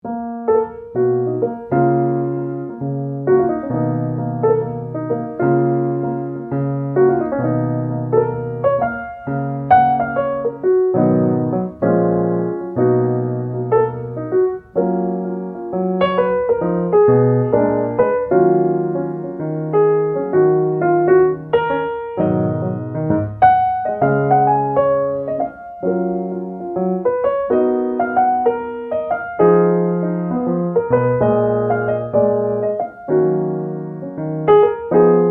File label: piano bar